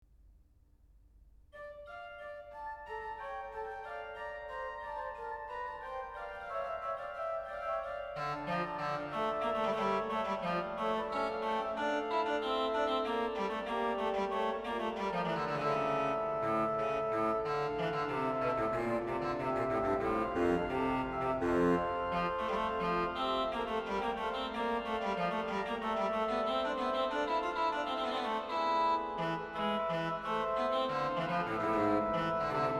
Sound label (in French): basse de cromorne; Louis Marchand; Premier Livre d'Orgue